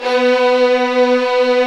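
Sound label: violins, fff; Roland L-CD702; Vlns 8 fff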